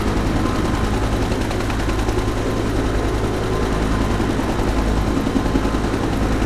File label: Chopper Fly; Max Payne